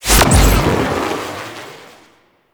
Nova Jump; Warframe; Nineteeen Ninety Nine; Deep Archimedia

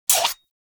Harpoon Gun Mech; Warframe; Sharkwing; Grineer Harpoon Gun

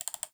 Computer Mouse Click; Warframe; Ambience; Nineteen Ninety Nine

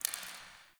Derelict Interior Water Drip; Ambience; Warframe; Orokin Derelict

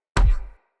Decoy Bow Shot Punch; Powers; Fey; Saboteur

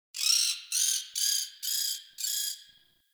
Tree Critters; Ambience; Props; Warframe; Duviri

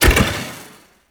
Ball Shoulder Check Burst; Warframe; Missions; Tenno Ball; Tenno Scoop; Impacts

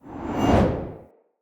Long Sword Accent; Weapons; Mother

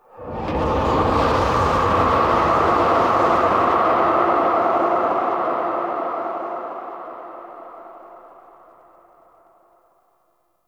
Sentient Vessel Delay Scream; Warframe; Tau

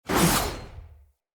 Knight Axe Whoosh; Weapons; Enemy; Axes; Snc Knight Axe

